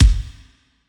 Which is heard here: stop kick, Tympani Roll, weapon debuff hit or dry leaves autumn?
stop kick